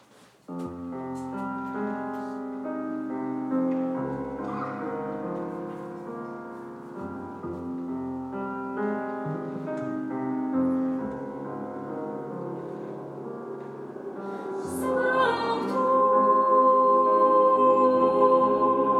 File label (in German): Sopran, Sanctus; Messe; Chor